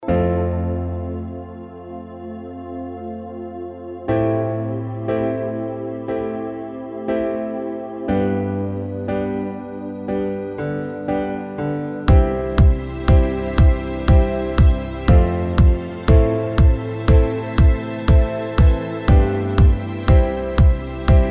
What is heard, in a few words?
Karaoke Song